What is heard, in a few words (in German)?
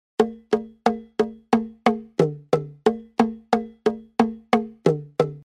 Bongo